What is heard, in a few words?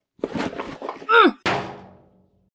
Climbing Onto Crate